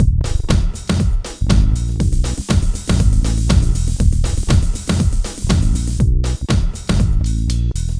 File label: alternative